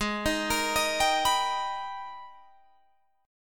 Ab Minor Major 9th Ab, Guitar Open D; 18,18,20,21,22,21; down

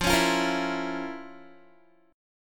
F Major 7th Suspended 4th Sharp 5th, Guitar; FM7sus4#5; 4E,4A,5D,5G,5C,6F; strummed

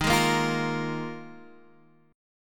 D sharp Suspended 2nd Suspended 4th D sharp; x,x,x,8,8,5,7,7; chordshape

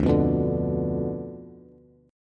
D Minor 6th Bass; Dm6 strummed; JGuitar

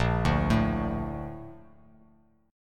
Bb Major 7th, Bb, down; BbM7 arpeggiated; JGuitar

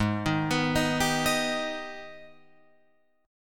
Ab Minor Major 7th, down, Guitar, Open F; AbmM7; arpeggiated

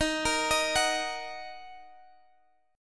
Eb Minor, Guitar Standard; Ebm arpeggiated; x,x,13,15,16,14; JGuitar

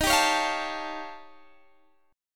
Eb 9th Flat 5th, Guitar, Standard; Eb9b5; strummed; x,18,17,18,18,17; JGuitar

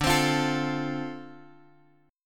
D-Minor 7th D Guitar Drop D, chord

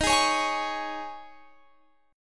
D sharp 7th Suspended 4th, Guitar, Standard; strummed; capo 9

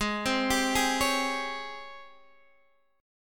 G sharp Major 11th, Guitar, Half Step Down

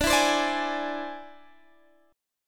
E Augmented 7th D

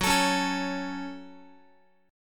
Gb Minor Gb Guitar; strummed; JGuitar

